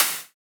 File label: Snare, Psytrance; Moonray